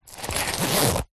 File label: purse pouch leather zip; foley